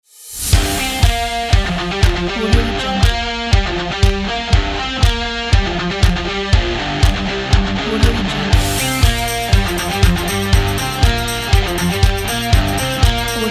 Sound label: indie upbeat rock